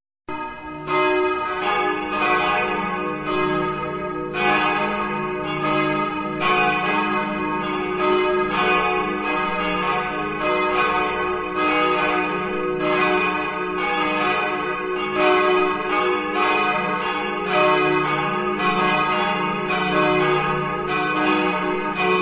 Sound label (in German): alle drei Glocken; St. Hedwig